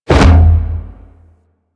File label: Pilot Breakout Hit Two; Warframe; Amalgam Carrus